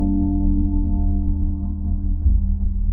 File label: notification